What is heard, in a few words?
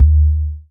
RAP KICK; E-MU Producer Series Vol. 2; More Studio Essentials; Composer